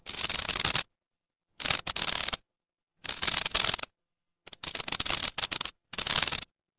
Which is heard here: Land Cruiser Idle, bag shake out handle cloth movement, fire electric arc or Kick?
fire electric arc